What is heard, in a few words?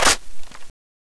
clip in, weapons